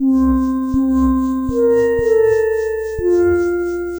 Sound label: Synth Textures